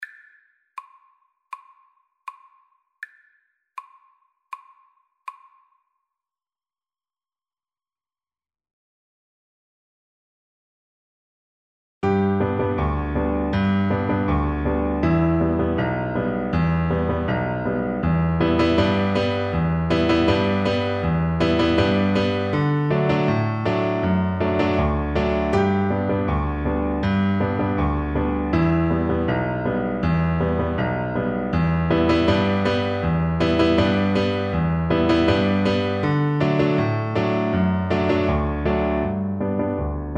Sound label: magalina zoula viola